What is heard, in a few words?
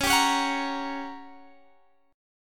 G Minor Major 7th Flat 5th C sharp